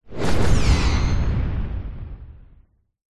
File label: science fiction explosion whisling debris; zapsplat